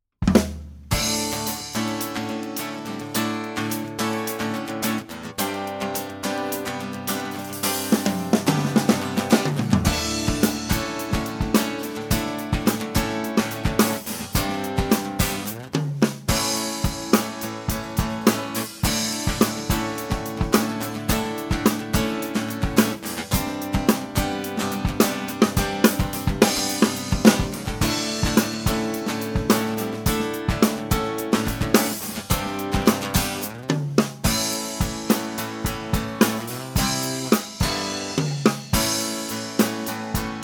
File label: Pop Rock